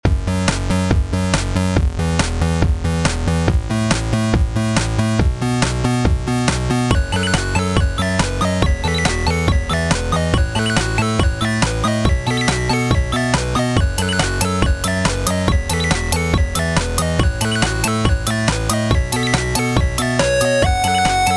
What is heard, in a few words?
Jumpy 8-bit Arp